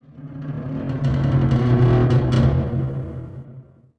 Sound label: Amb Constructions; Red Orchestra 41-45